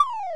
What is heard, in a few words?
goal; YOSHISISLAND